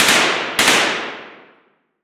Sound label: Weapon Gun Machine Gun 9mm Multiple Shots Interior Shooting Range; AdobeCC